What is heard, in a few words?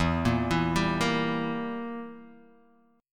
E Diminished E, Guitar Half Step Down